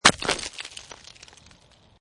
Snow And Ice Ice Impact With Ice Fragments Debris; Lynne Music

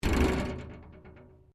WRECK METAL PLATE RATTLE, Wrecked Cars; impacts, crashes, scrapes; Objects

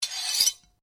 Blade Scrape Flick, Sword; Foley sounds for melee weapons; Swords; Combat

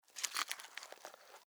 Insect Chew Bite; Insects Eating; Animals

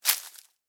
Footstep: Individual Footstep On Dry Leaves; Human; Footsteps

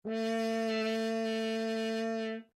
HORN A4 FF LONG STOPPED, French Horn in F; Alexander French Horn in F; Sound Effects:Musical; Foundation3

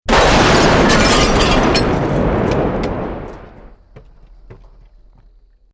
Building Collapse Medium

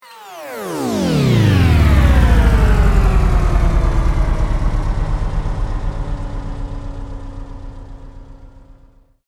Sweep Mechanical Slow

